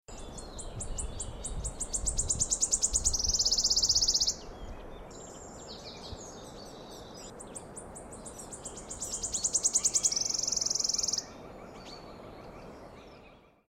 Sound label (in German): waldlaubsaenger